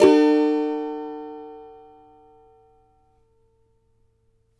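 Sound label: E major, Guitars; Spectrasonics; Hans Zimmer Guitars VOL-2; CAVA CHORDS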